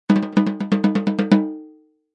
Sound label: Cartoon Drum Tympani Tap; Comedy/Cartoon; Higher pitch, rolling quick taps; Comedy/Cartoon - Drum, Tympani Tap 02: Higher pitch, rolling quick taps; Funny Sound